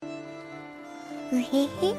mwehehe

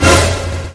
alert hoohoh

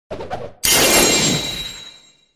legendary sound

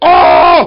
heavy stroke

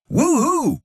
woohoo

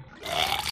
puking sound

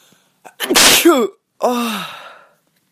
sneeze

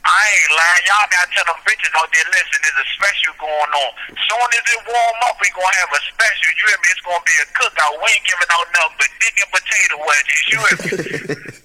cannonnnn

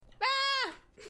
aghhh

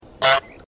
honk

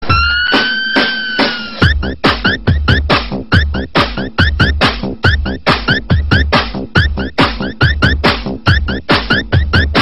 budotsss

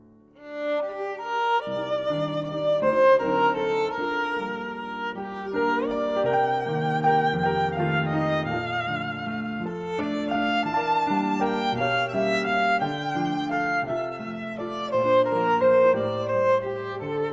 intro music

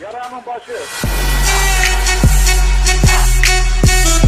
bas trap

